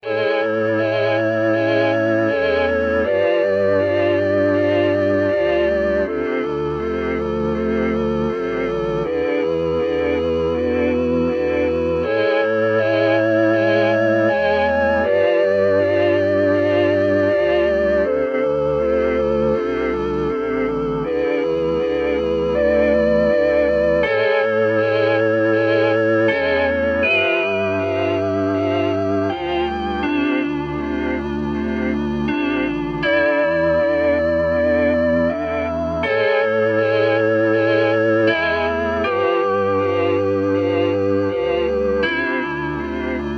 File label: mellotron, original music